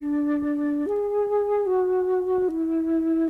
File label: flute